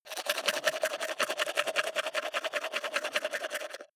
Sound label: teeth brush wet open inward fast